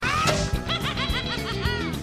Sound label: laughing; Stitch